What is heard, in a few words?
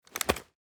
draw; tacz; daffas arsenal